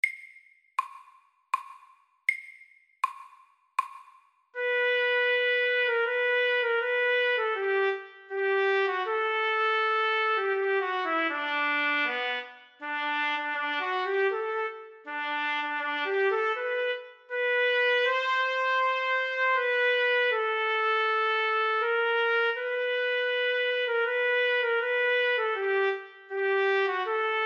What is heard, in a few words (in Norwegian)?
Lyrisk etyde i A dur MIDDELS